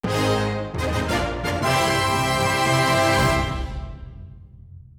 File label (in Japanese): ファンファーレ